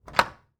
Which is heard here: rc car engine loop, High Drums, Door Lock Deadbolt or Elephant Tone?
Door Lock Deadbolt